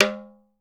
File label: TALKING, African percussion; Voice Crystal - Steve Reid Definitive Percussion